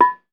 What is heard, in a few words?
BONANG, Bronze Gamelan; E-MU Formula 4000 Series Vol. 4; Earth Tones